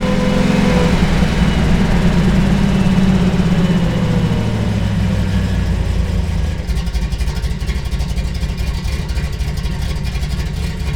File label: v8 throttle off fast loop, vehicles; Half Life 2